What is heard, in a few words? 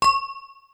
Glass, Yamaha DD-35